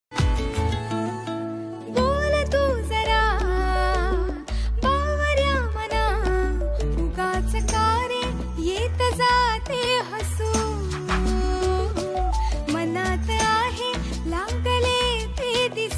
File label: Marathi Love Song Female Version